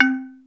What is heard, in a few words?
xylophone, Yamaha Motif ES drum machine; 300 Drum Machines; Yamaha Motif ES-Series